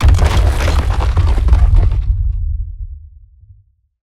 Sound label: Rock Prison Formation; Evolve; Behemoth